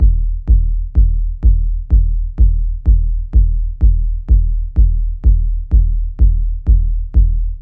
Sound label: heavy kicks, drum loops and breaks